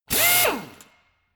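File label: impactor long high, drill; maschinen; bohrer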